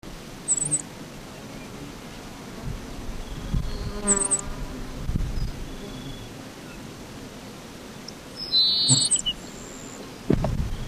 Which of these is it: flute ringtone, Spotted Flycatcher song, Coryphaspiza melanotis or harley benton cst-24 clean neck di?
Coryphaspiza melanotis